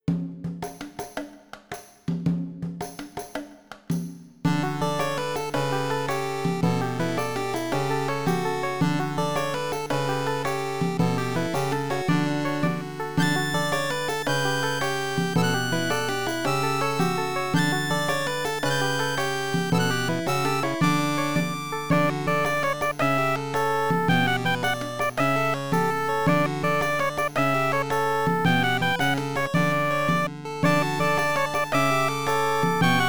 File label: 8bit Clock Town